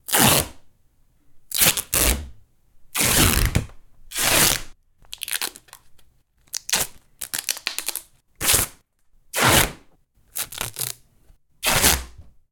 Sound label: tape duct rips; sinematic source recordings; Sonniss GDC